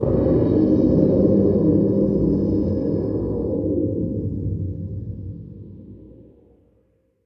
VFX Atmos

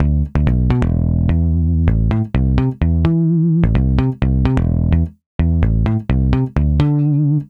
128BPM Melody; Vengeance Samples; Doubleride Kit

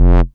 Bass Multi Rev F; Vengeance Samples

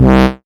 Bass C, Offbeat Bass

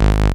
Synth Bass; Vengeance Samples; VEE Synth Shots

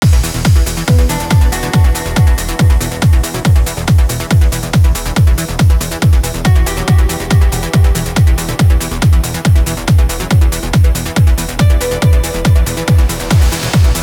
Complete Mix 140BPM, Eminor; Vengeance Samples

